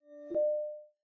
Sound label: Charging Started; Infinix XOS 15